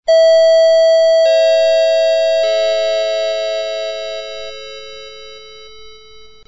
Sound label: gong